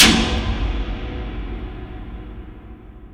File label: BIG SLAM